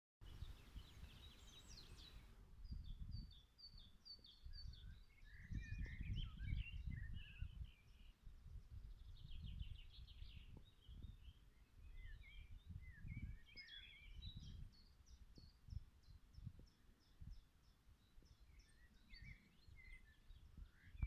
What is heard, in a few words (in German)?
vogel gezwitschere; Rigi-Trip 1904